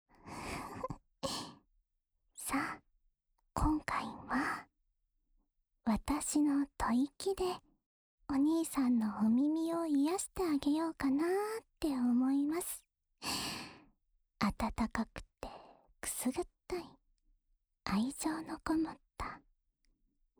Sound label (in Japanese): お耳ふーふー（右耳）, ASMR